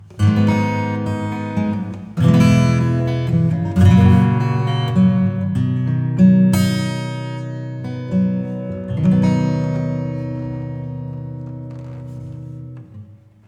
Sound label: Reverb Acoustic